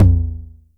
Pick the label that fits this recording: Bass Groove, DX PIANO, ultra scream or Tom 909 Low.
Tom 909 Low